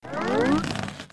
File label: plant grow; soundspvz